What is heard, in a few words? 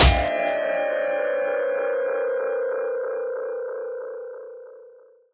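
SYN right, Production Effects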